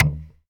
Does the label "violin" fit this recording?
No